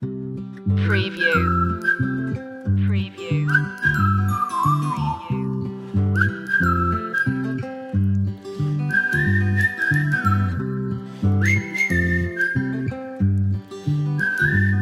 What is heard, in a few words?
guitars bells bass and whistles